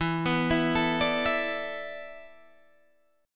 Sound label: E 7th Suspended 4th, Guitar, DADGAD